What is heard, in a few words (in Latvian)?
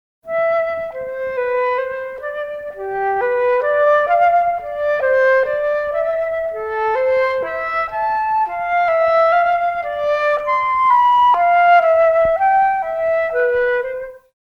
flauta sonate do maz; j s bahs